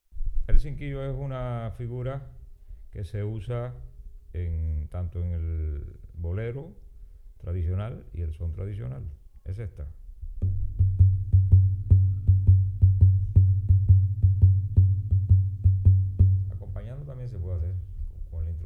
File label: cinquillo